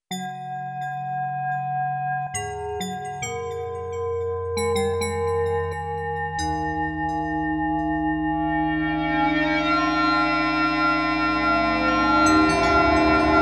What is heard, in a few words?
noise